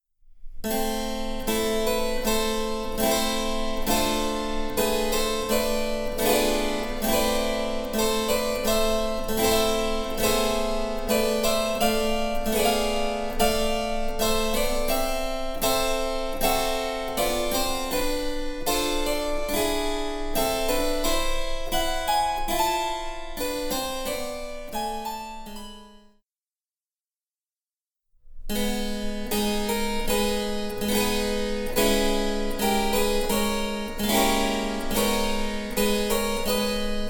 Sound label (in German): Cembalo; Mark Lindley WTC 1